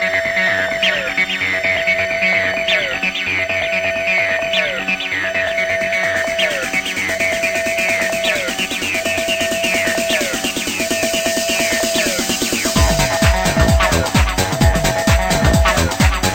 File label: Circus Bells